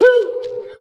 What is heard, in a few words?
woo